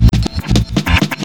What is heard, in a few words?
FX BEAT